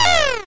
retro damage hurt ouch; Infernal Radiation

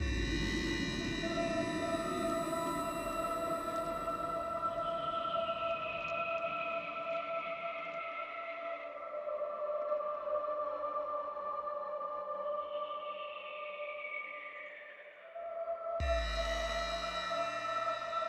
Music Gameplay State Tension; Music Hideout3; H3 Level Area=Fog 1

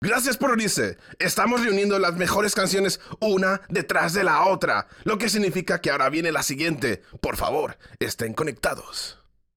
Music Vehicle Radio Events

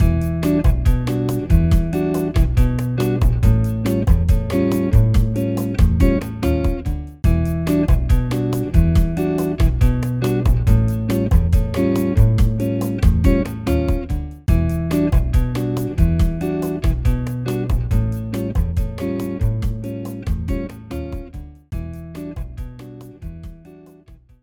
BGM Bossanova

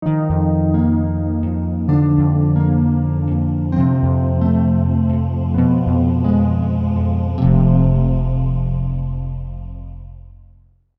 Mod Calm; Sid Meier's Civilization Revolution 2; vita

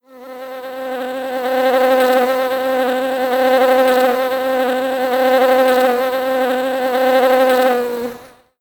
bee; Toy Story Mania; iOS gamerip

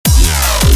Dubstep

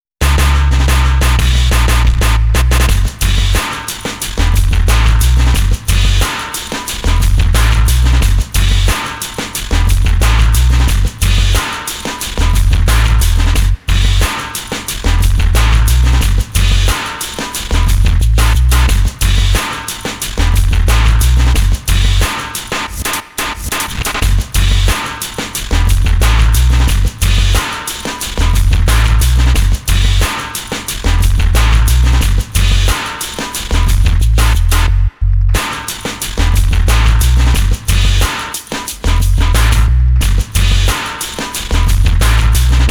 RHYTHM BOX; Dynasty Warriors 3

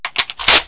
reload SMG Clip In

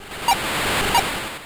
Encore

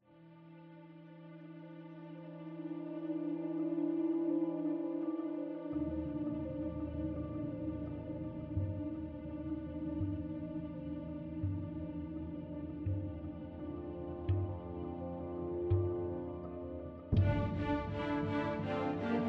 Suspense; Game of Thrones: A Telltale Games Series

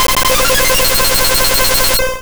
Hyper Beam; sfx; attack moves; pokemon; gen 2; gsc

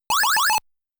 Special Flag Get

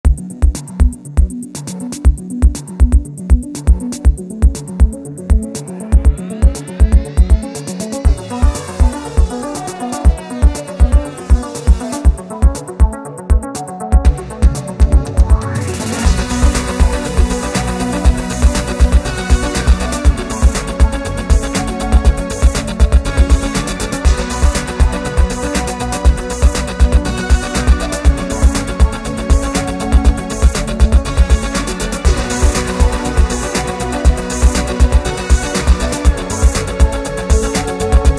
Electronic Trains; Cities Skylines; Rail Hawk Radio

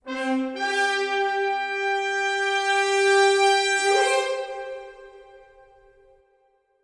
Music Fanfare; Fable Anniversary

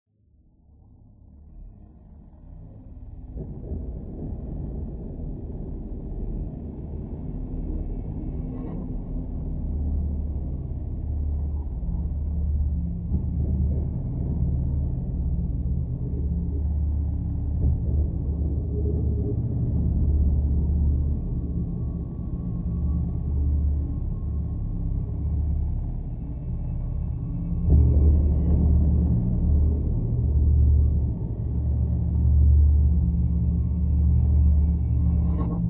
Ambiance Flooded Pad

